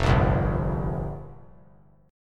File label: F Suspended 2nd Suspended 4th F down; strummed; JGuitar